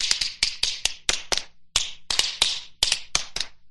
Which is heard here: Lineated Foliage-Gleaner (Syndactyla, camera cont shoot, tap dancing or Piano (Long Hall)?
tap dancing